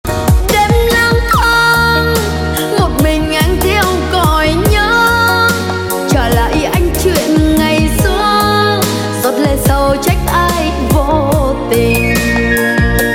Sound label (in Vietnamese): nhạc chuông ngẫu hứng bolero tiktok giọng nữ